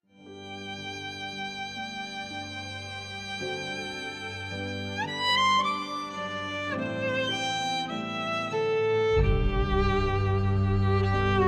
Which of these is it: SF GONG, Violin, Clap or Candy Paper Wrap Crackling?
Violin